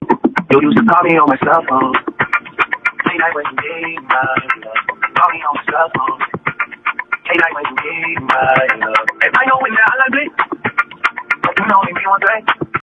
applause; mario bro DT

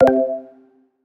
pause continue click; muni muni! v1.1

